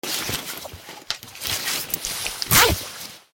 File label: fabric wipes and zipper; soundscrate